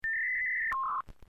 internet sound

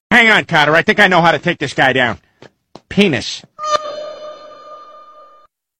meow

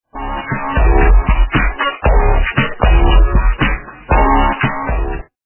low quality ringtone

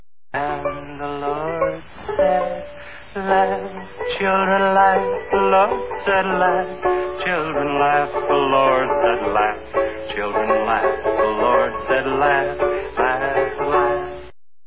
laugh children laugh; the lord said

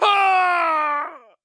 k o scream; mk9 nightwolf

